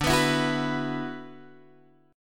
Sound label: D 6th Add 9th, Guitar, Open G